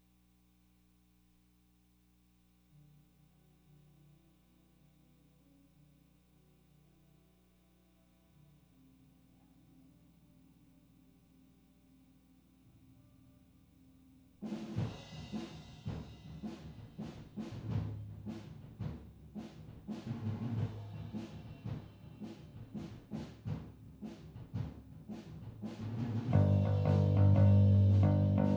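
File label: SM57 stereo Bass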